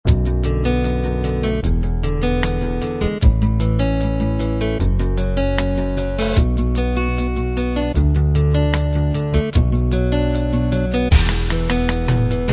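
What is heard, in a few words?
Rock Ballad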